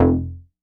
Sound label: bass F1, Korg MS-20